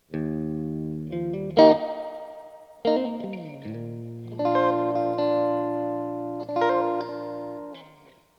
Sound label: rock